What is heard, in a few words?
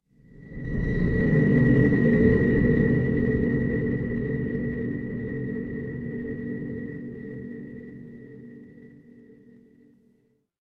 horror stinger